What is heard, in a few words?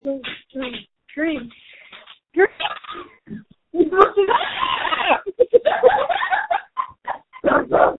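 screams that feature laughter; screaming into a phone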